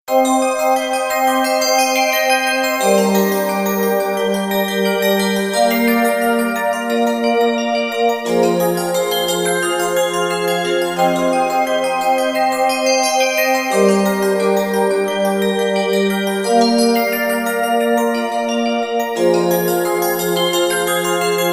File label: Cool Sound